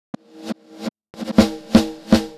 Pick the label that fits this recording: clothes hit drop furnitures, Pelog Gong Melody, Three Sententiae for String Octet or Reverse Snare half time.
Reverse Snare half time